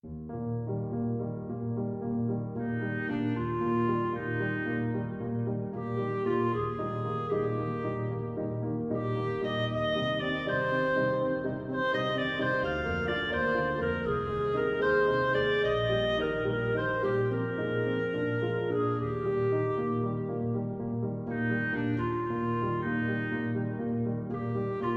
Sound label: clarinet sonata; saint-saens